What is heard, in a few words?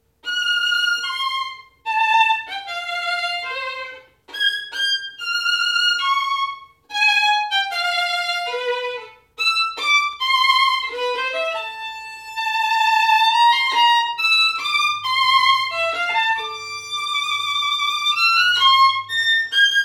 Violin; KMEA Year 4; Brahms; orchestra; audition excerpts